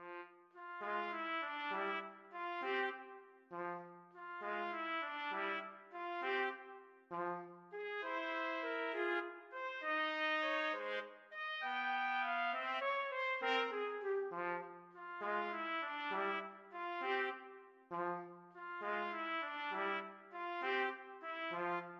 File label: trumpet duet